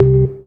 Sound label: Synths C; Vengeance Samples